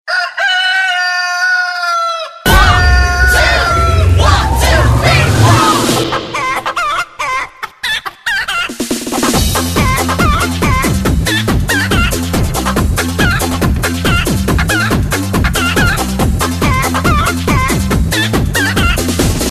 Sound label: Mobile ringtone